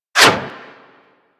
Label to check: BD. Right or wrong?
Wrong